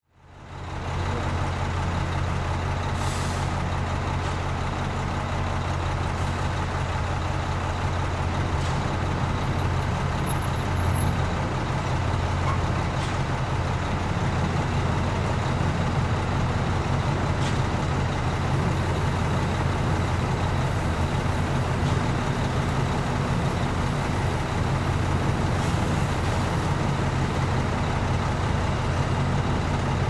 Cement Truck Idle, Industry, Cement, Truck, Idle, Mix, Cement; Trucks (Sound Effects:Vehicles; SoundEffects-BlastWave